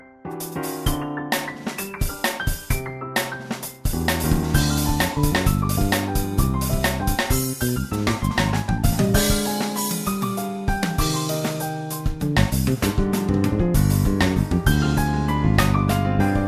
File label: nokia jazz